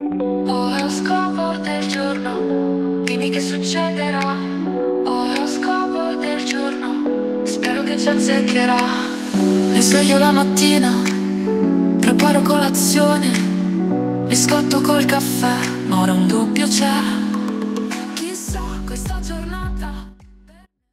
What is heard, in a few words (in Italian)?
jingles oroscopo del giorno